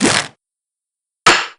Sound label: confident slam; auchi